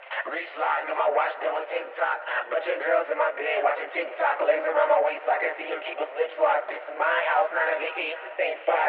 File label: Phonk Vocal 108 BPM; Cymatics